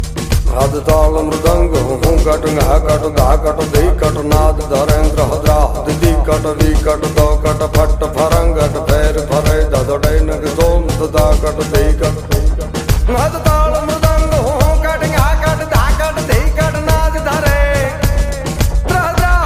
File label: taal mradang shambhu; ringtone